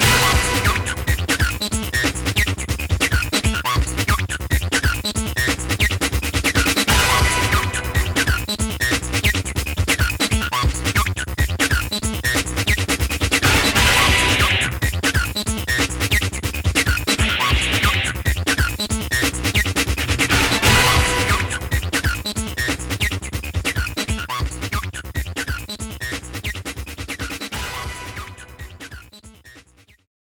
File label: BoomBox loop